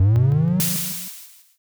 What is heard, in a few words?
compressor